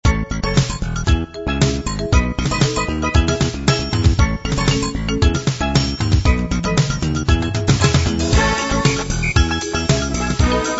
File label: caribbean steel band